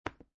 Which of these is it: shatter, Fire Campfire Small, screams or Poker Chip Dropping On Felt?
Poker Chip Dropping On Felt